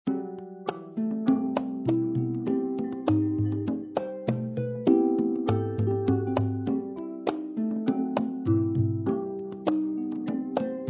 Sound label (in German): Harfe D2